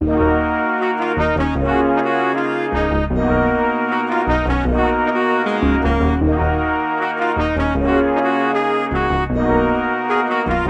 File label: Classic Melody Loop 155 BPM D# Min; Cymatics; 2020 Melody Collection